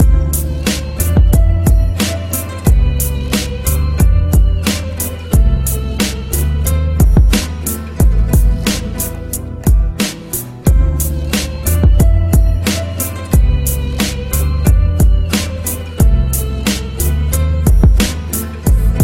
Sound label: peace, ringtone; nokia; blues